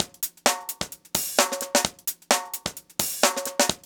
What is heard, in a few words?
130, DRUMLOOPS